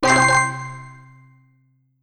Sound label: Gameplay Strike Hit